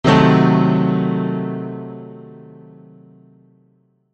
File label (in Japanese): ガーン ピアノ